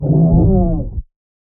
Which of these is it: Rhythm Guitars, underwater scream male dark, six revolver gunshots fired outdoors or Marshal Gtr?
underwater scream male dark